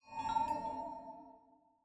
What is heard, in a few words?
notif friendreq rcvd; social